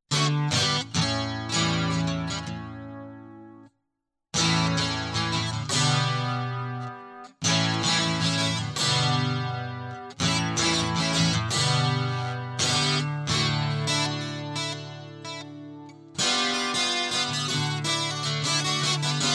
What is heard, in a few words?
guitar loop